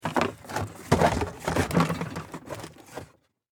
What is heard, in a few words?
Household Cabinet Handle Objects Movement; Kitchen